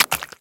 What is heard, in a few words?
spew, infestation, bulb